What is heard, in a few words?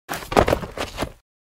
Body Falling